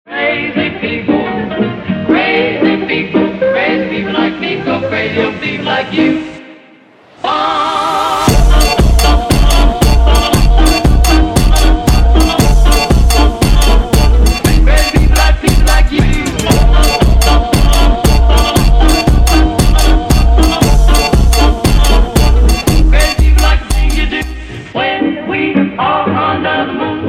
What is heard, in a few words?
Funk Slowed